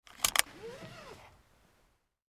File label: car seatbelt unlock and release